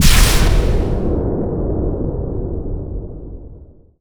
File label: frost nova; weapons; dota; lich